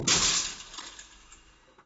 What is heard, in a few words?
Net; bowling; Gutterball 3